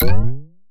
Retro Jump Simple; Bounce Jump

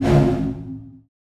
Hit Surprise; FREE SFX PACK VOL 1

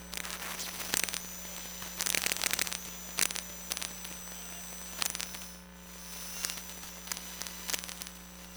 Cell Reception, Electromagnetic Sounds; Micro Pack; NazdyNate

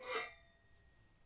slash, weapons

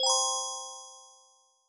confirm, tones; inteface sfx pack 1

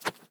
Swish, organic wooshes; Micro Pack

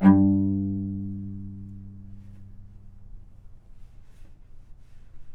G2 - Sforzando, novice cello

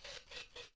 wood saw; tools

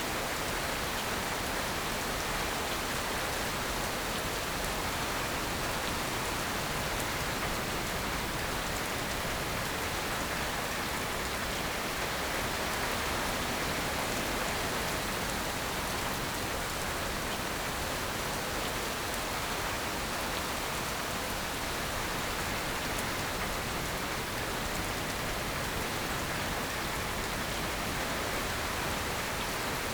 Ambiance Rain Strong Loop Stereo; Nature; Essentials Series NOX SOUND

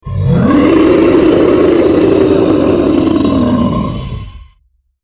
Monster Growl; horror; horror sfx vol 1

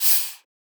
Breath Exhale; FREE SFX PACK VOL 2

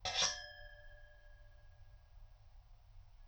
sching; abstract; Free Sound Assets

